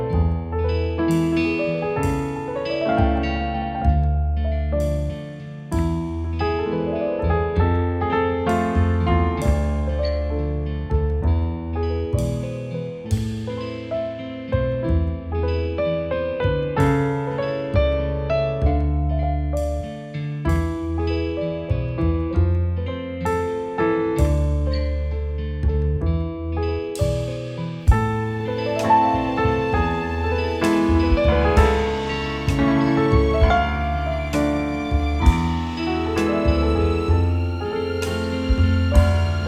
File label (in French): valse